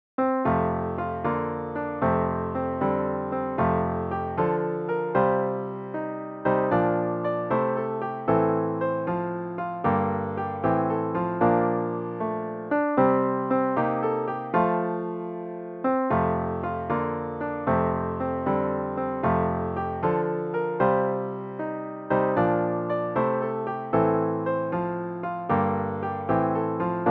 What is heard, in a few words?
piano only